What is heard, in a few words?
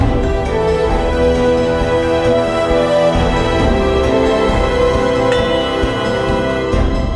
Vamp to Continue; The Ladder - Sequence 04b